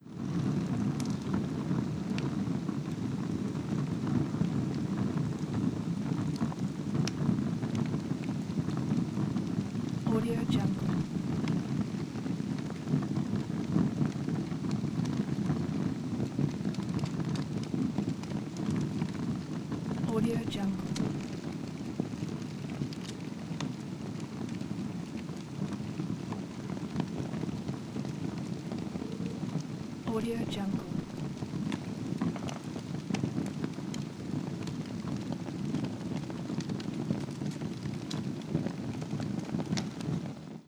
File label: small modern fireplace